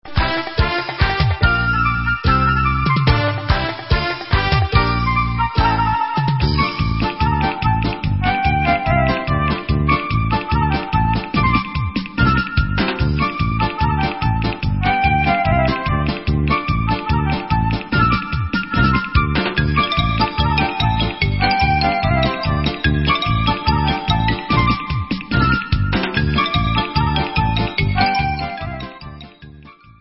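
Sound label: Instrumental, Israeli Dances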